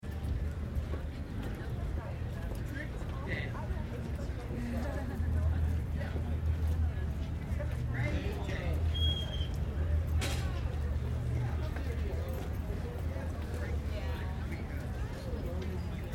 College Campus Ambience Long, Student crowd walla, mid 20s, male; male and female voices chatter. Very distant city rumble; School Sound Effects